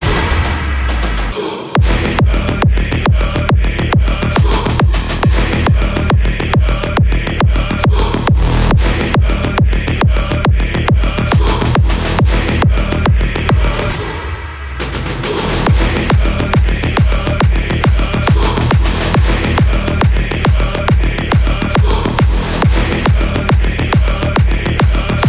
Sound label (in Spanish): PsyTrance Drop Masterizado; psytrance flp mixing and mastering; km music academy